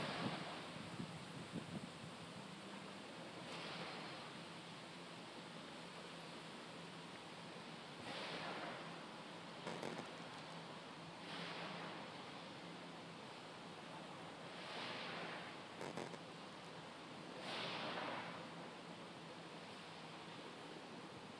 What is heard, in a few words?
demolition noises